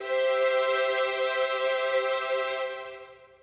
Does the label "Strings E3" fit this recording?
Yes